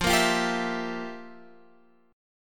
F Major 7th Suspended 2nd Suspended 4th Guitar Open F, chord; FM7sus2sus4; x,12,10,12,10,11; strummed